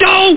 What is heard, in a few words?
doohh; deathfx